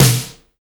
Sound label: SNR BALLAD, Snares; Roland - Rhythm Section